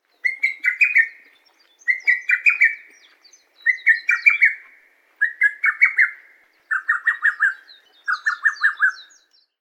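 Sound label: hototogisu, lesser cuckoo; takao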